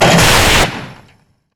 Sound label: Aircrafts Eject; DCS